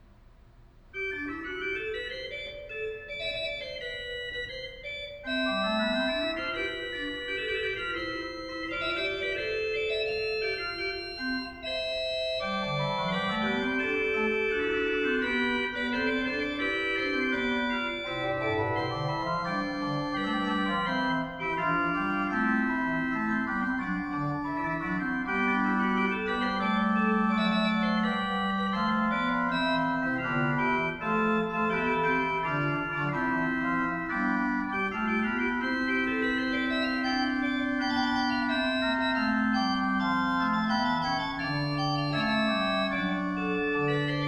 Fugue, Organ; Magnificat tertii toni; Johann Pachelbel; Complete Organ Works

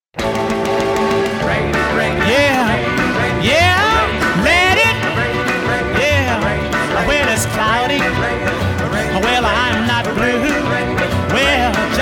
Doo Wop; Wuschel's Doo Wop Paradise

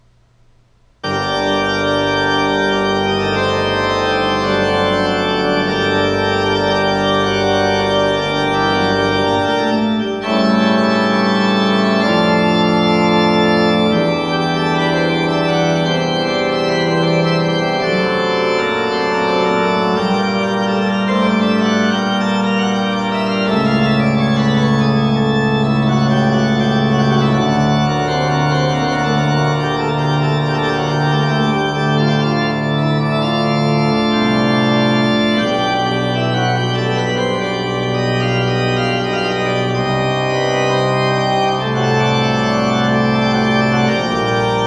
Prelude in g Minor, Organ; Johann Pachelbel; Complete Organ Works

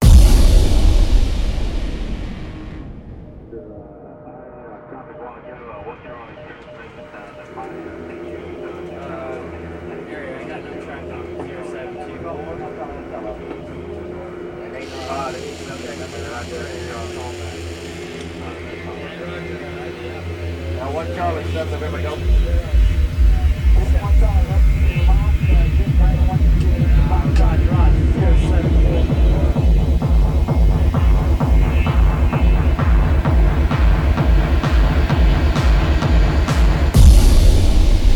Hardstyle; Gabber

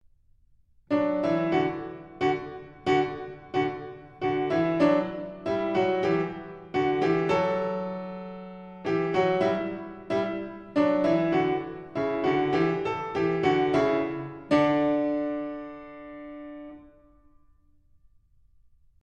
Staccato and Legato, Piano; Bartók; Mikrokosmos, Sz. 107 - Book 2 No.38

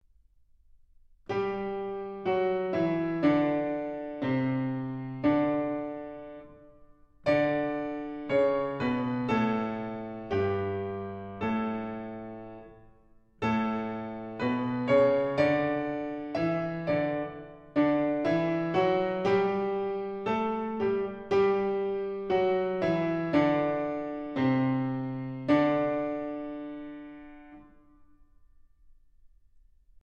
Village Song, Piano; Book 1; Mikrokosmos, Sz. 107; Bartók; Complete Solo Piano Works

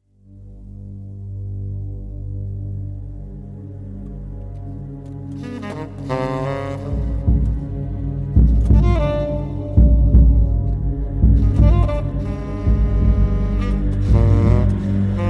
Sax Canoe

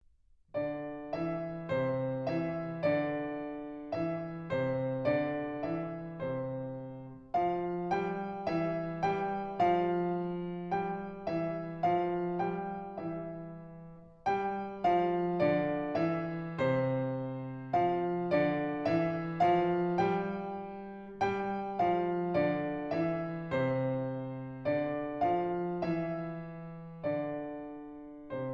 Unison Melody, Piano; Book 1; Mikrokosmos, Sz. 107; Bartók; Complete Solo Piano Works

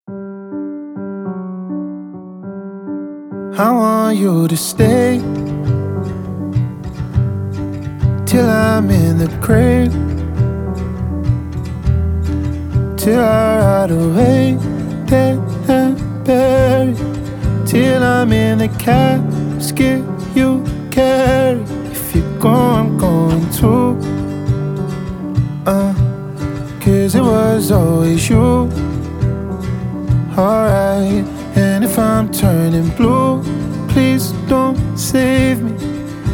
Acoustic

